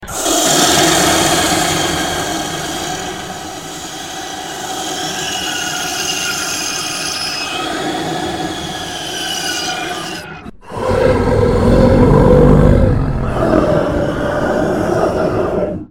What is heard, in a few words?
monster alien grunt sleepy ringtone